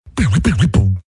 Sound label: Beatbox; mensaje